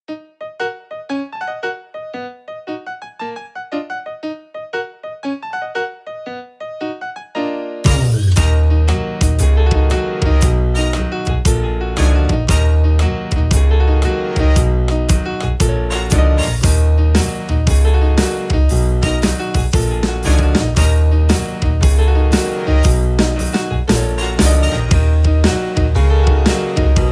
Cool Piano

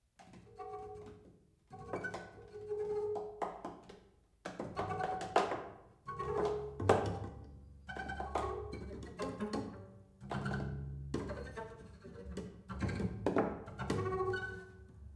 Prepared Double Bass

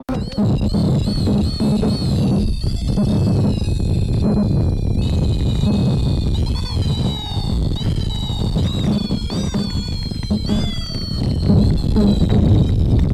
Asheville Noise

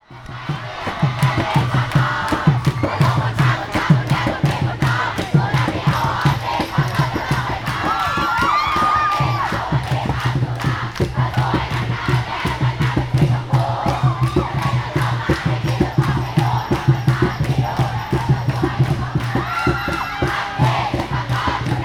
Bangkok street kids; THAILAND; Les Cartes Postales Sonores; NOISE ON EARTH Vol3

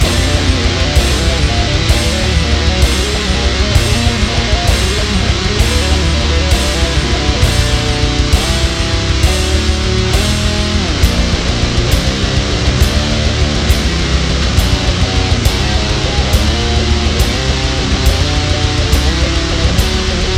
Dark Chaos Fury Metal Accelerating; CUES for film TV games etc

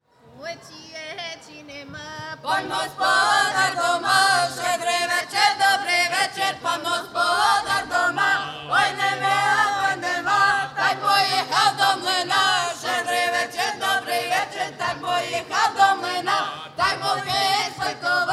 Oy Chy Ye Chy Nema carol; Live at the 2014 Golden Festival

